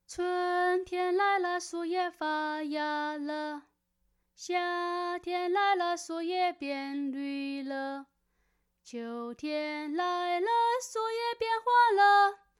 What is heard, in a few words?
Singing Example